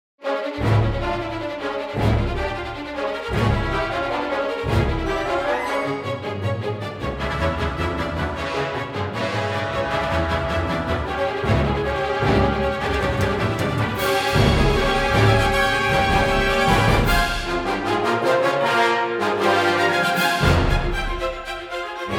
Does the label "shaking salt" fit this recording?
No